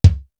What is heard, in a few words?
room kick; East West; Global Sounds